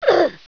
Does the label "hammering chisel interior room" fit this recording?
No